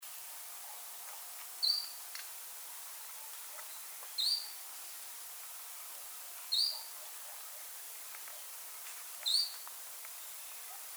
Western Striped Manakin Machaeropterus striolatus undescribed Peruvian form